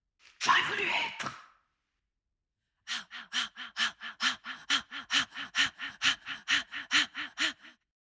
souffle

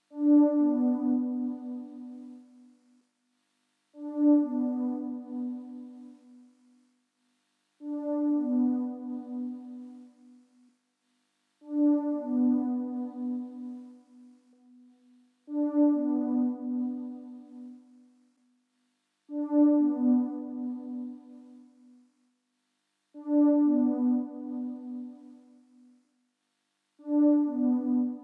nappe douce

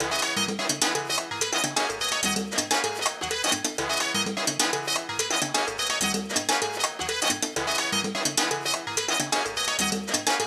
sample origine avec reverb

